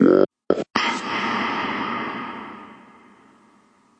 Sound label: block; Ze Franks Pain Pack